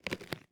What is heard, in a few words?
Clothing